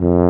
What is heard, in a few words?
F tuba F; BRASS; Best Service; Alpine Volksmusik